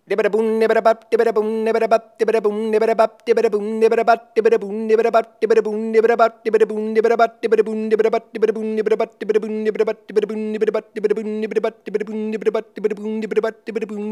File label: Vocal Percussion Deh Deh Boom